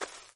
herbe, pas

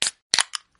canette

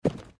cheval

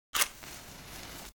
allumette; deadmaze

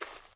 herbe

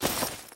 sac, bruit; deadmaze

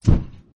gaz

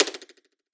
fleche

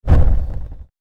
flamme; deadmaze

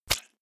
coup autre; deadmaze

